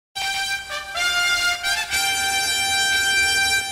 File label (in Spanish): flauta; power ranger verde